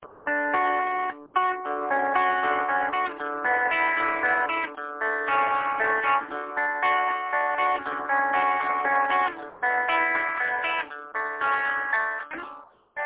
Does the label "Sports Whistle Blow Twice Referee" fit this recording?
No